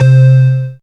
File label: synth, c, Top End Tinklers